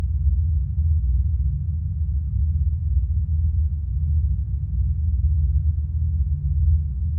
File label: Cave Rumble; CS Level3